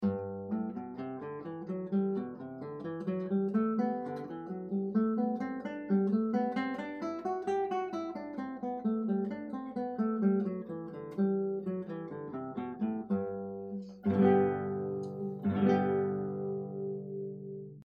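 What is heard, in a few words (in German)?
Tonleiterstudie A, Gitarre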